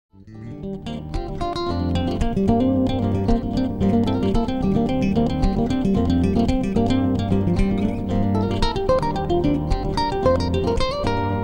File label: Solo Bass Contemporary